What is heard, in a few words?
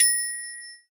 bell